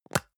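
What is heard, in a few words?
deodorant can lid open medium; haus-garten; badezimmer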